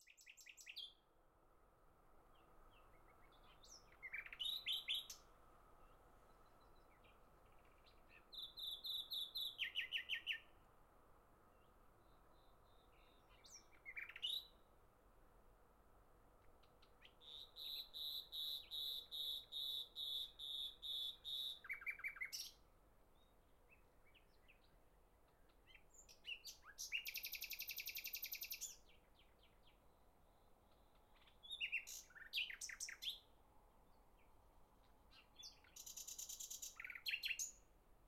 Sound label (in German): Frühling Nachtigall